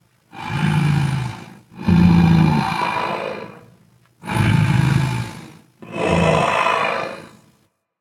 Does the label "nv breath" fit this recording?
Yes